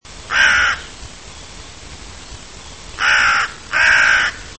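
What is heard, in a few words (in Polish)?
wrona, krukowate - corvidae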